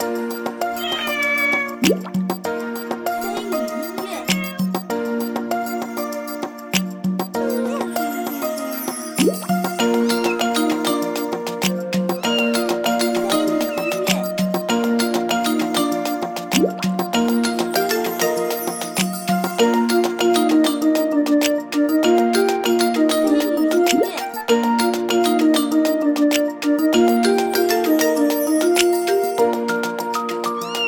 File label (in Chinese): 背景音乐